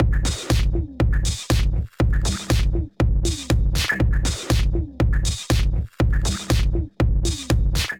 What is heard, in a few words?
Kit, Drums; Alarma; Ableton; Factory Packs; Punch and Tilt